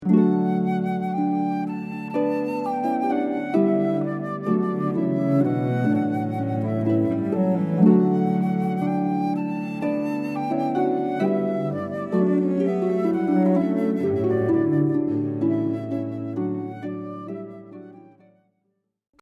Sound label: Ode to Joy, Beethoven, harp flute cello